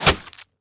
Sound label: bullet hit